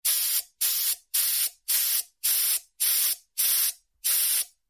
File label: Short High Pitched, air